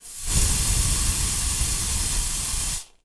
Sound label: Gas Leak Med Burst